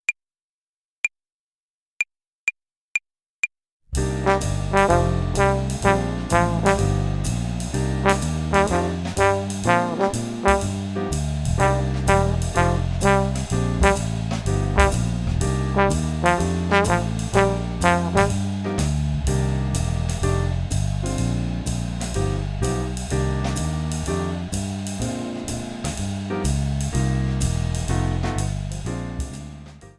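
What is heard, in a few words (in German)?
Swing Phrasierung Übung; play along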